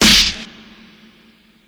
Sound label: Present Steel Snare Drum D Key; Duration 0:02